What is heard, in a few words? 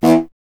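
wind contrabassoon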